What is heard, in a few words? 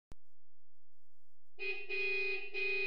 claxon